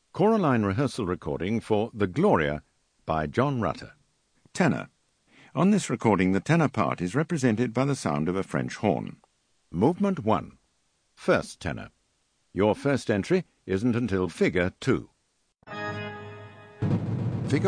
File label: Tenor